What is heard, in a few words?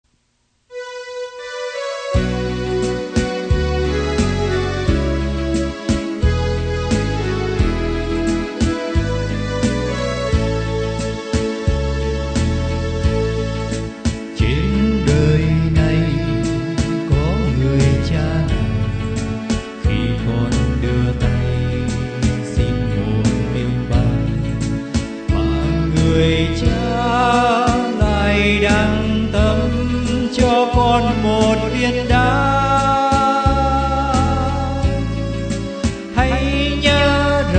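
soprano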